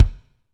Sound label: KIK, Drumscapes Roland